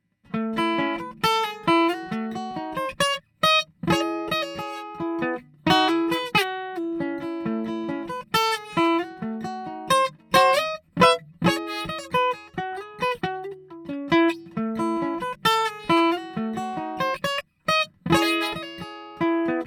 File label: Collings D2H Neumann M147, guitars